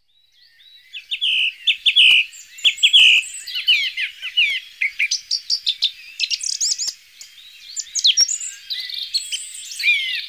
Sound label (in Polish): Spiewak, Ptaki Glosy